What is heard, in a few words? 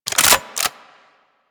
Clip In, shotgun; DE; Warframe; Weapons; Strun